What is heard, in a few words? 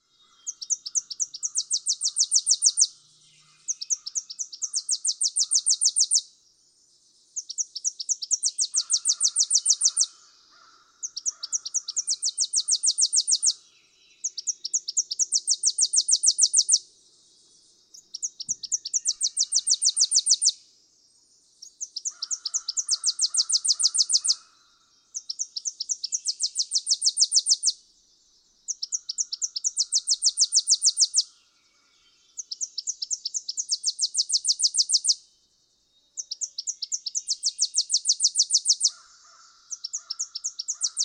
Tennessee Warbler